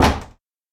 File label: Door Close; RPG Creation Kit; RPG Sounds Pack 6; qubodup